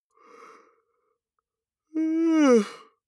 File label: male yawn vocal high; koerpergeraeusche; menschen; soundpool